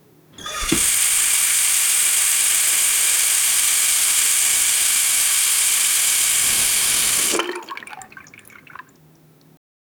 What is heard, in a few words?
realistic sound of a kitc, sink